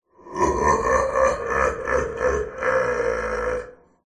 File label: horror demon devil evil laugh processed; zapsplat